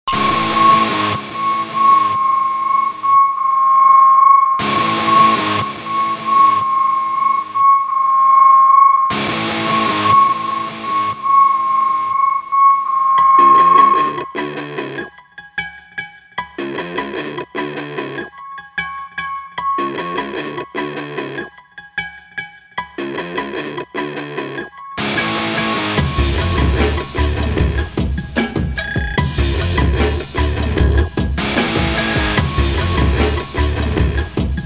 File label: noise instrumental